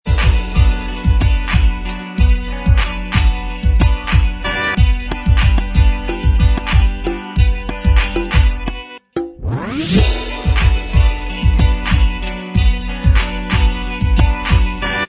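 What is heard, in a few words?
instrumental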